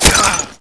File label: axis die; dod